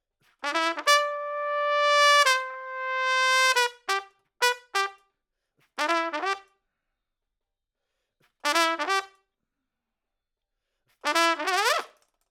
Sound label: Trump